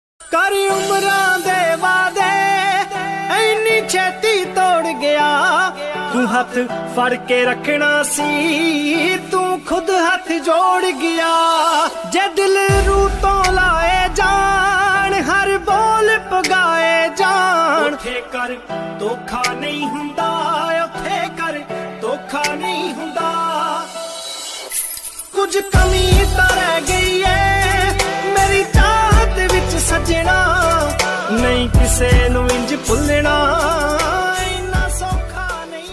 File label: Dark Punjabi Song Ringtone; ringtones